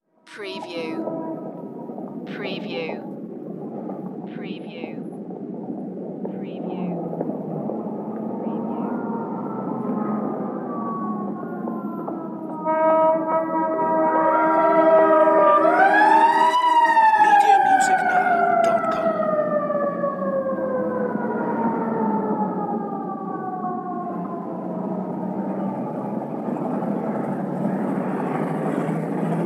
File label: Ambulance City Sirens Footsteps Traffic